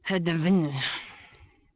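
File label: female angry; People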